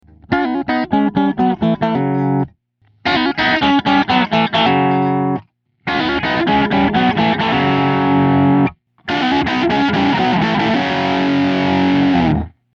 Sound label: Guitar